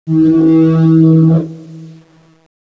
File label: roket start; CSO KrakenBoss